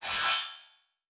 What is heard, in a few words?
Flash Animation Short; Multimedia Internet CD-Rom Flash